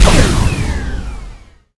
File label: grav fire, weapons gravity; Quake 4 Alpha; id software